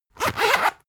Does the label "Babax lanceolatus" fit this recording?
No